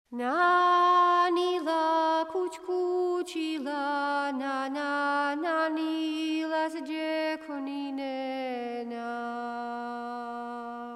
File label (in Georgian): nana akvnis dasazinebeli svaneti; Mzetamze